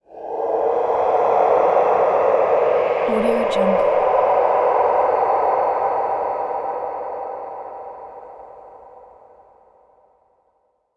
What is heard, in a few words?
monster roar distant reverb